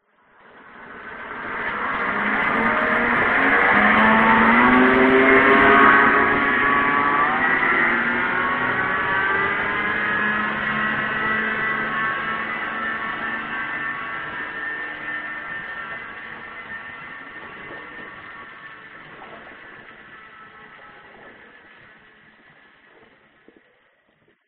transport motorboat pull away underwater recording